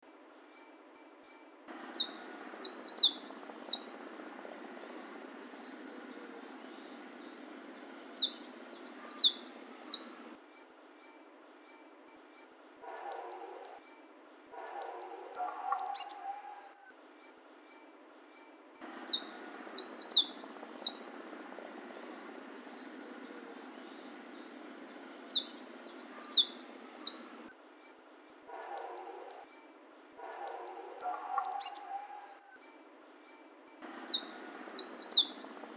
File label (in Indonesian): suara kicauan burung madu matari